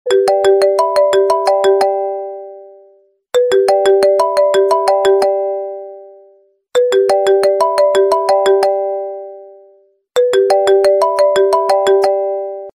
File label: Apple Iphone 5